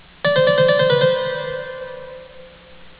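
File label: Trillo und mordant (trill combined with mordent; Palmer: "trill with termination")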